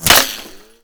ricochet; Escape from Tarkov

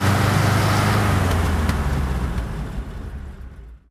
engines; World of Tanks

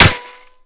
impact dirt; Modern Warfare

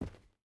footstep rubber boots cloth run; Rust

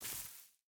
footstep hide grass walk; Rust

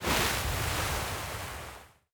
ship engines; World Of Warships

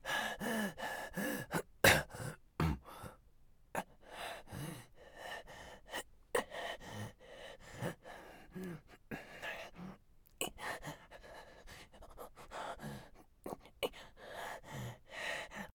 breath hurt; Escape from Tarkov; scav2

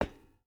footstep boots metal walk; Rust

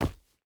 footstep hide dirt jump land; Rust

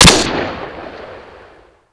ump45, weapons; ModernWarfare

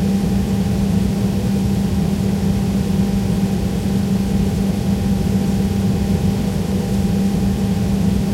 apache engine spin start; War Thunder

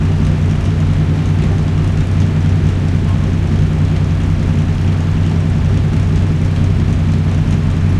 maus engine int rpm 33 load, tanks; War Thunder

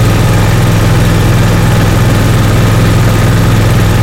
tiger diesel engine rpm load, engines tanks; War Thunder

